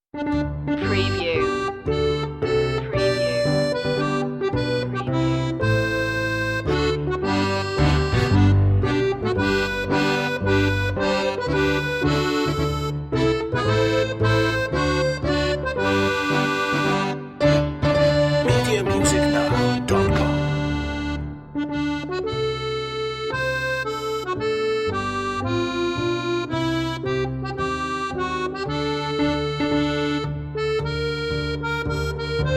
la marseillaise french national anthem accordeon

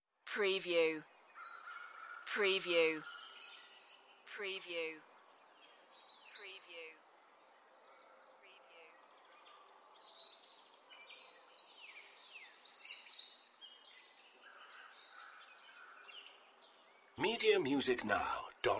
Nature Birds Morning

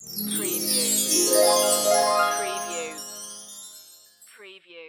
Magic Wind Chimes Harp

